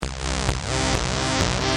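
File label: hard sequence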